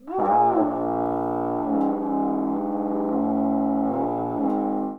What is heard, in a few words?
GROWL; E-MU Formula 4000 Series Vol. 1; Hip Hop Nation; Booty Brass